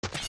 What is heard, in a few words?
steps dirt jump; Jumping and landing on Dirt (adult); zelda; OOT